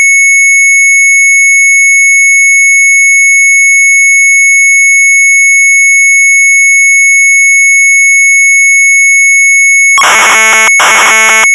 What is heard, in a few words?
Sharp MZ800 Tape; NOORS MOZAIEK; retromelsarchive